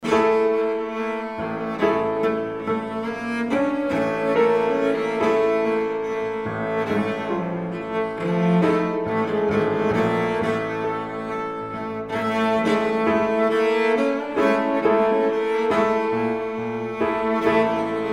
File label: CELLO SONATA